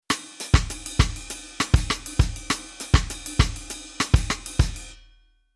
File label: Rhythm Latin